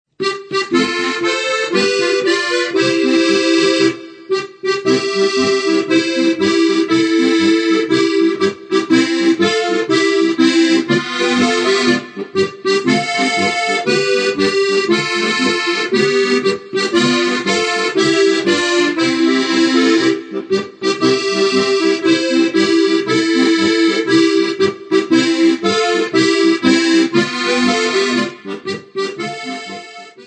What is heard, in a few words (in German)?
Haferlgucker Polka